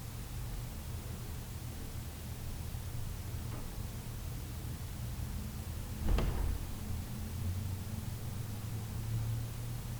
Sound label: Unexplained Noise Sounds Like a Footstep 2nd Floor